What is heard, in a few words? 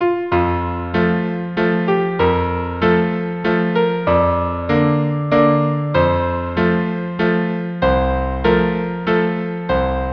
violin cello; Un di felice; La Traviata